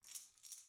coins; golden tavern

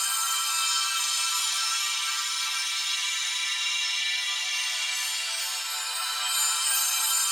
ambience; butterfly staxx 2

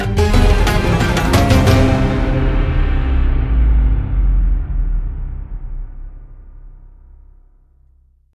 Freespin Outro Splash; mercy of the gods

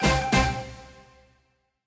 smallWin; jackhammer 2

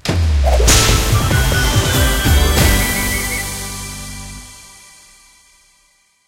jackpot Start; irish pot luck

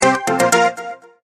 bet sound; jackpot 6000

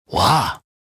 panda Medium Win; shangrila

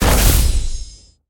feature Octo Land; rage of the seas

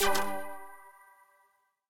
spin Button Click; dazzle

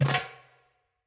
quick Stop Drum; wild bazaar

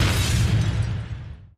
symbol First Glow; elements

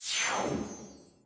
Text Animation Out; aloha

